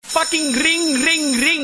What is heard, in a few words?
ring ring ring